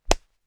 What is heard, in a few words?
Real punch